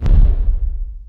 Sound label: kicks, Bass Drum